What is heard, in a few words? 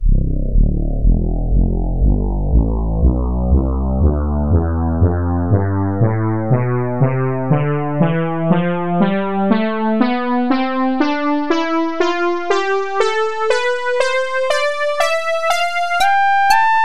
chiffer lead tonleiter